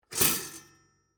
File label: Toaster Down, Household, Toaster, Down; Appliance Sound Effects; Appliances (Sound Effects:Household); BlastWave